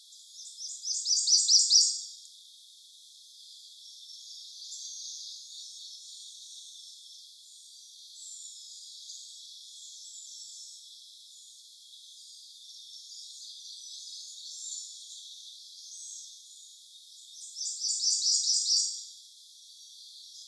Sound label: Prothonotary Warbler; Henryton Rd